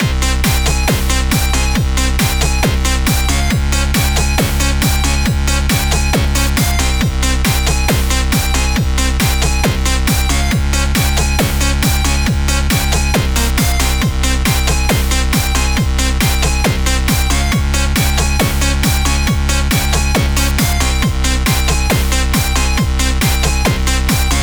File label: Chiptune; Chiptune Music Pack Vol. 4